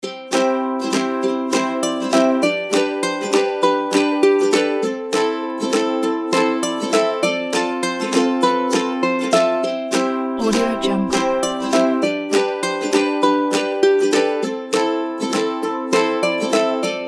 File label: be happy